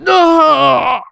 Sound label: painsevere; Civilian